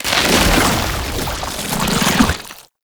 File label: tank infested charge rise; Enemies; Abilities; Warframe; Lasrian Tank